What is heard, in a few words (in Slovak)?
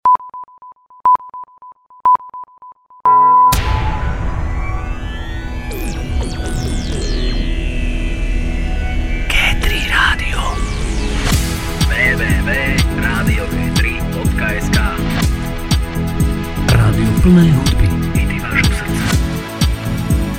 hodinovy jingel; radio g3